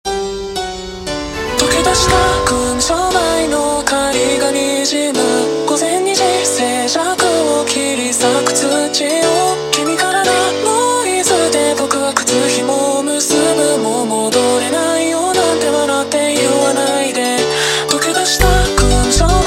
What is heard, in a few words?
Montagem super Slowed